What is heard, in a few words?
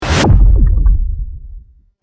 cinematic dive underwater